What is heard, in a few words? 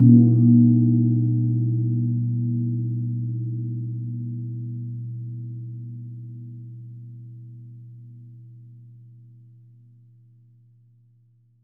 GONG A#2; USB Soundscan vol.60; Asian Traditions; AKAI